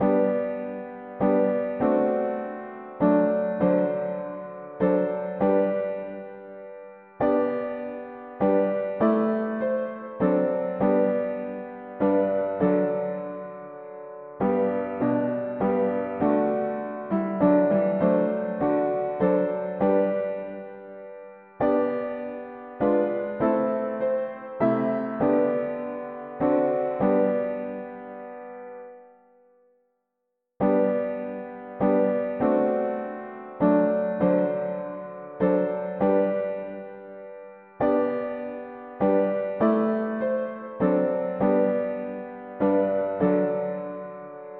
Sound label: mono piano, hymns; Jesus spotless Lamb of God